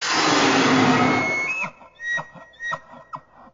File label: Wendigo sound